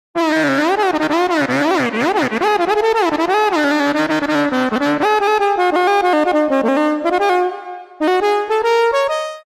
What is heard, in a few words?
saxaphone